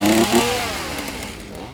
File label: CHAINSAW